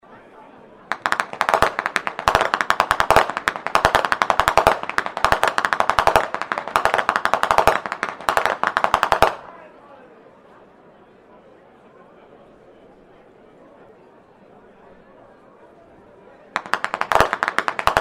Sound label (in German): schnalzen